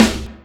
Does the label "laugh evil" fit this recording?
No